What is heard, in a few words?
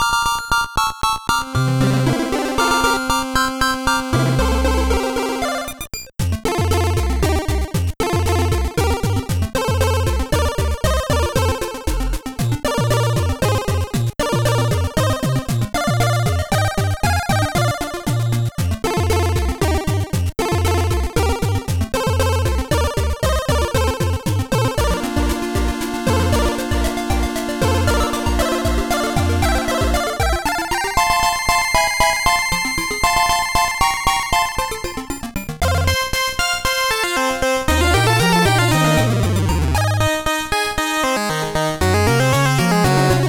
8Bit Beach Cave loop Start; PMD2